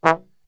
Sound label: E 2, Brass Super Section, TRMB SHOT; Best Service; 90 s Sample CDs